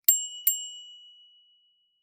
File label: Pedal Chime; PomoLuxe